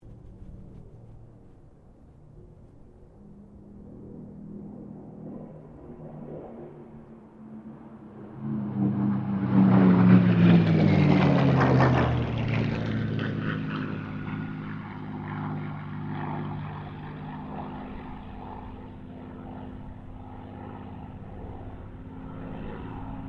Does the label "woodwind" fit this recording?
No